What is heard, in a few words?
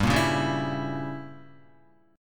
G Diminished 7th chord, down, Guitar; strummed; JGuitar